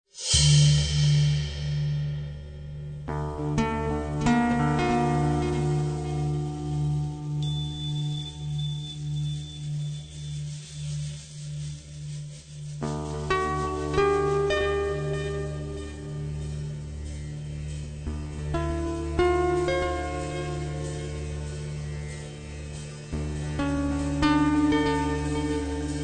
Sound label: ambient, guitar